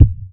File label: Kicks